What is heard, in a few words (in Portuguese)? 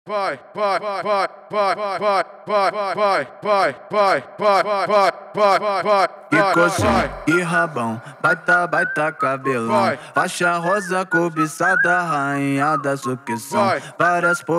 alto falantes especial funk mix; Triton alto Falantes